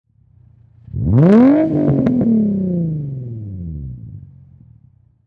Infinity G35 Exhaust Single Rev, High Performance Car; Auto, Power Car; Exhaust perspective; Revving, single motor rev; Race Car; Lynne Music